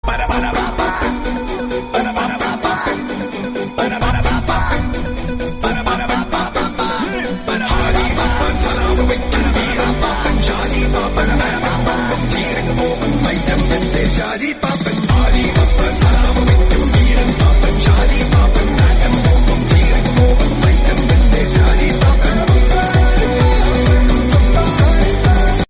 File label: theme malayalam